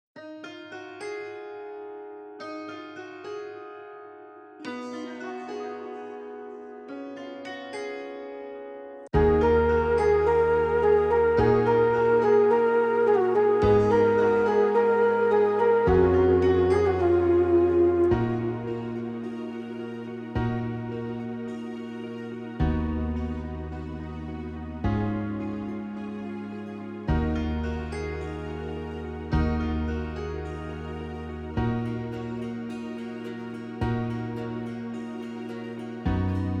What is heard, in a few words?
Female Version Karaoke 107BPM; BasserMusic Studios